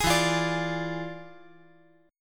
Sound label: E 7th Flat 9th, chord